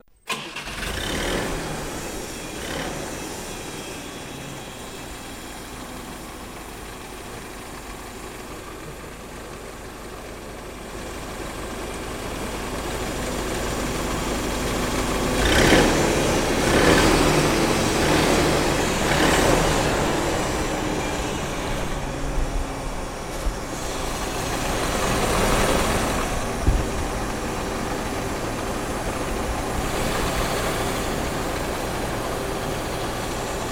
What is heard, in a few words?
Forklift Start, Maneuver